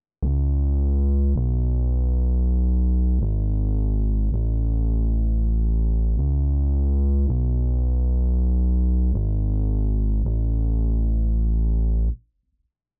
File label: 162 BPM E Maj Bass; Loop Stems; Cymatics; Miami Guitar Pack - Pt 1; GPS